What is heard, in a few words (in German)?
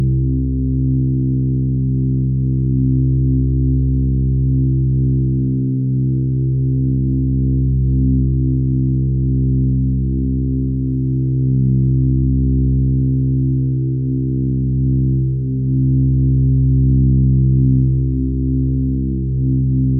drone tief